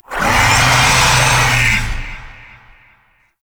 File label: Pirate Kraken Call; Warframe